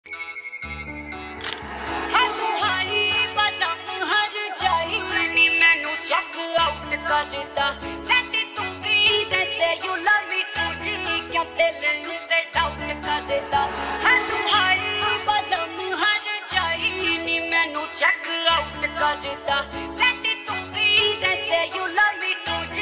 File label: ringtone